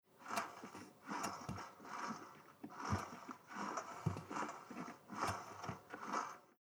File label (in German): Filmprojektor drehen der Filmrolle am Knopf; Tondo